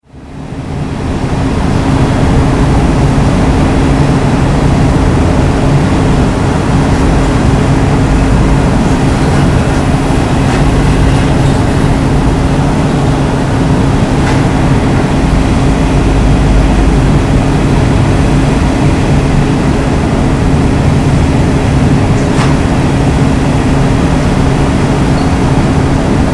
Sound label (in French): clim toit